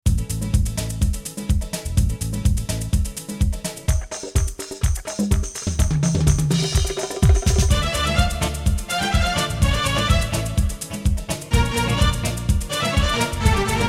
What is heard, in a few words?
Arabic Turk